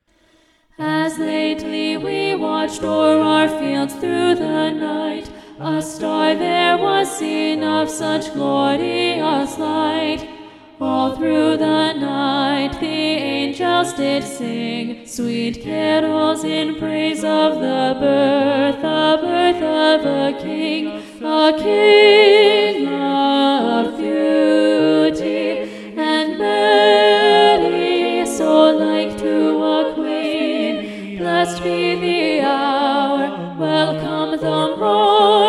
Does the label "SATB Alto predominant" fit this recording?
Yes